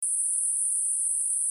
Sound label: bark